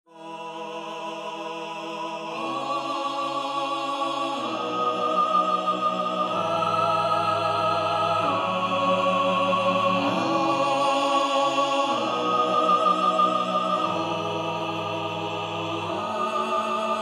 counterpoint

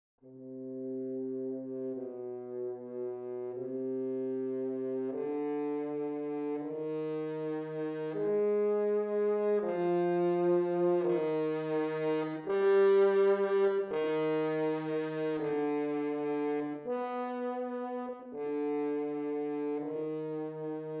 Horn

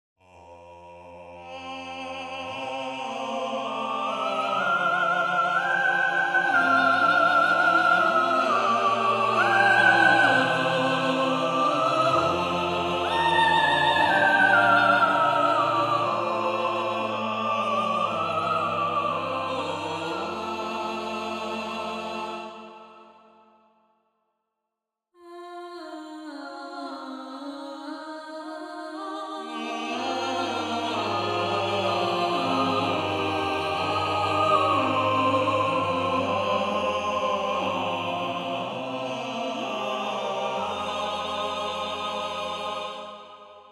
counterpoint

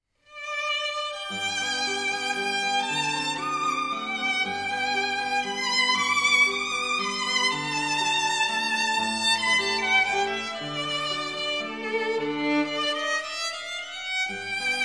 Duetto in G Major Larghetto; Paganini

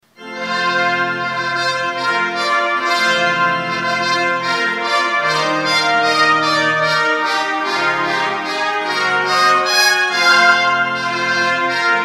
Fanfare for 2 trumpets

